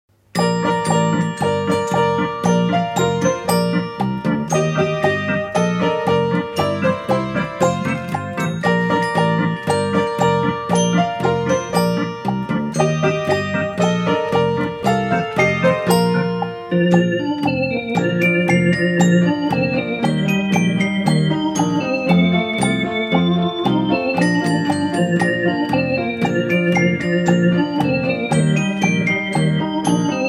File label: Jingle bells